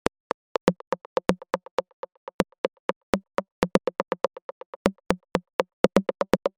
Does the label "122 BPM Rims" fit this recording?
Yes